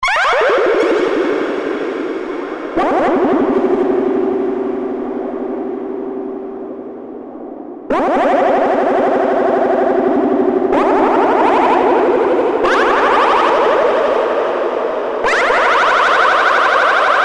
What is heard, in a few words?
Alien Spaceship